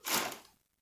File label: get on